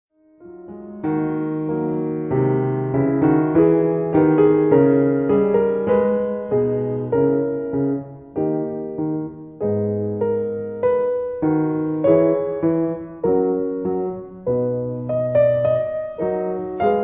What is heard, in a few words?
Clavier, G sharp minor Fugue; The Well-Tempered Clavier